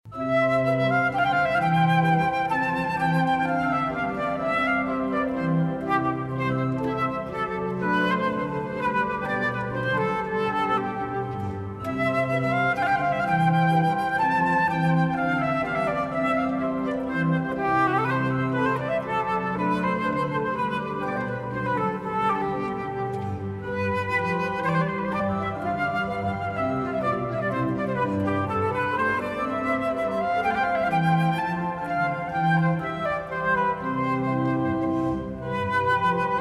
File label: Sonata for Flute and Organ in C major; Bach